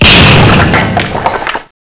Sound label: asteroid hit